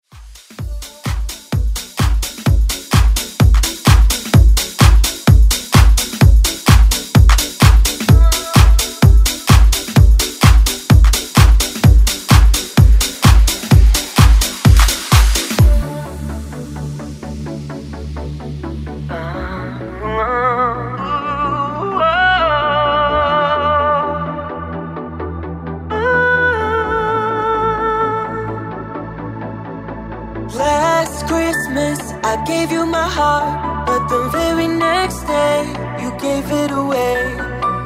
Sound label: Dance Ext Redrum